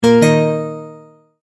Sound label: multimedia tone verified